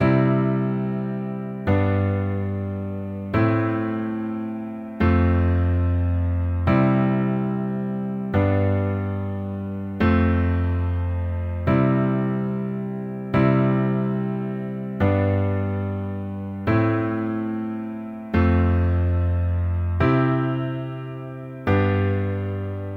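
root position with left hand, accompaniment both hands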